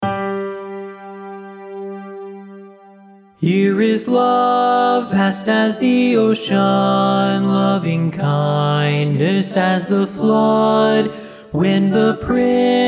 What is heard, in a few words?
LEAD